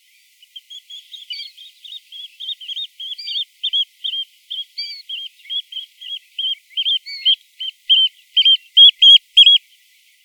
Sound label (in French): chant; cui-cui